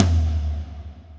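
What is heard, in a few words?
Tom Lo Wet, Alesis D4 drum machine; 300 Drum Machines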